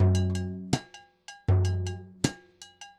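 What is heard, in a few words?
timbales cowbell 80 bpm, latin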